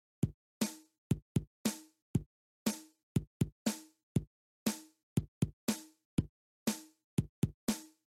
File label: rhythm